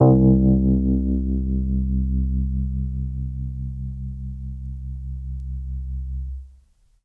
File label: DX200 Rhodes Vinyl Synths C1; vinyl keys